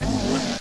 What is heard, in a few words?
TELEPORT; sprites